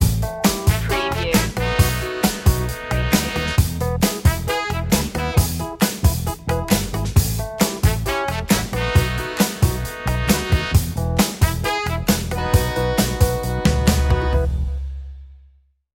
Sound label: easy groove; 15s